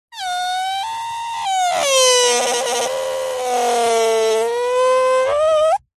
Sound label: whoopee cushion squeeze fart long squeaky